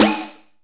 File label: Shock Snares, Percussion; Dance Synths